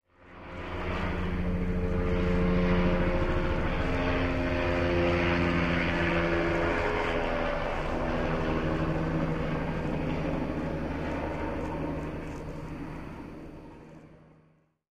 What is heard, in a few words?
helicopter